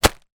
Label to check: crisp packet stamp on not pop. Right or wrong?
Right